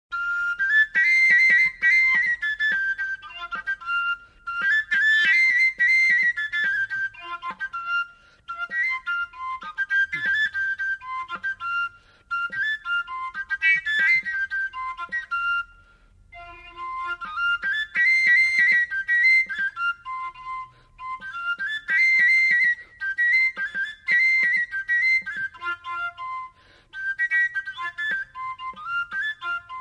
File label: Flute player from Mazovia region